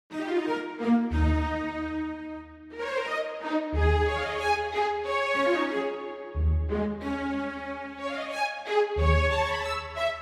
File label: strings